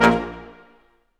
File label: BRS Quintet staccato, Symphony Orchestra